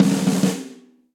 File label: Snare Press Ambient Loud Punch; Snare Articulation; Drum Hits; Session Drums Studio; Standard Hits